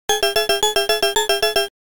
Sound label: Time Out Warning, arcade; Dig Dug